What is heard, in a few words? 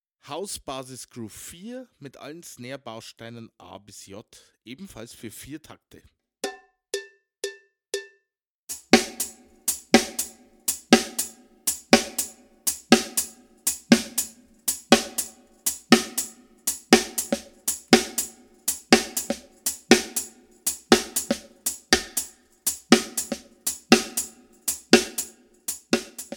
House-Groove 2; Schwarzes Album; Bum Bum Tschack Grooves Fills